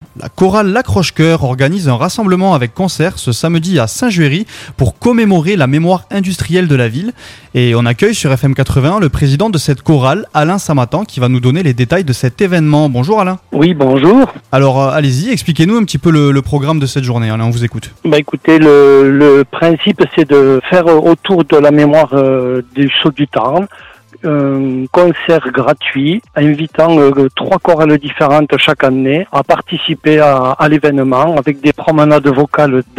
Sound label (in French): Chorale; Journée Concert St-Juery; VIE LOCALE